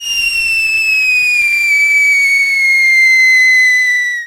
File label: firework start